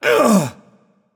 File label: grunt hit; smite; poseidon